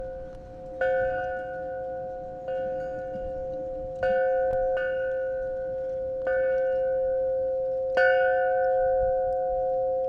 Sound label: chime; slab city